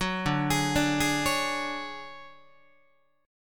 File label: D Major Sharp 11th, D, down, Guitar; arpeggiated; JGuitar